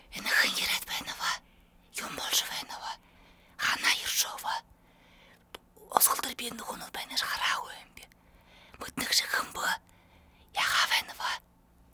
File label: Whisper